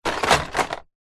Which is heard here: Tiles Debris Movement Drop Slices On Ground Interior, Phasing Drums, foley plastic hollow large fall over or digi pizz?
foley plastic hollow large fall over